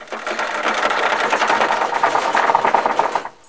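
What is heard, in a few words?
chain door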